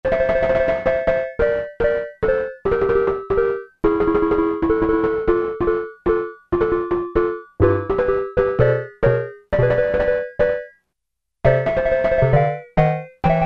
Korg Poly-800, Steel Drums

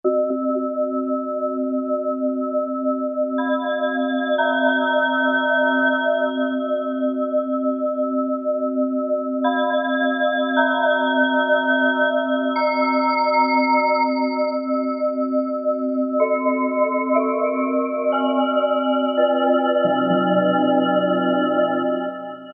Eerie; Generalmusic Equinox

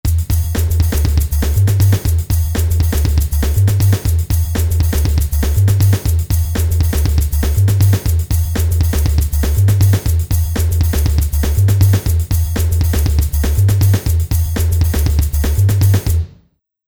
Rap Hip Hop

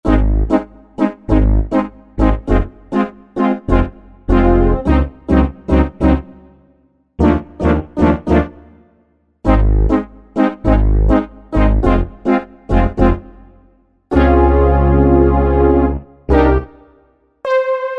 Soft Analog, Korg Wavestation A-D

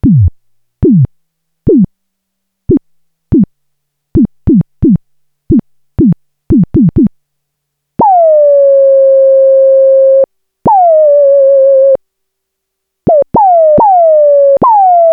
BARKING, Roland SH-101; Factory Patches

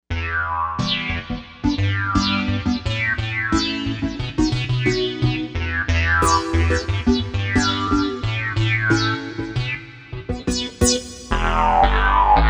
Res Synth, Korg M1Rex; Factory Patches

